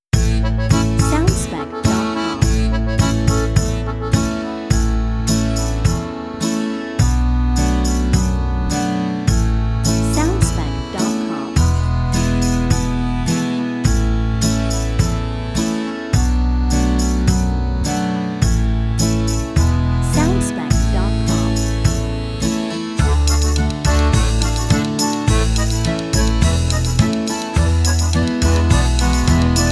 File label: Mediterranean Folk Dance